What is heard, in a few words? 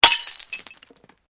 GLASS SHARD SMASH